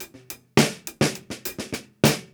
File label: Beat Loops; Zero-G; Monster Beats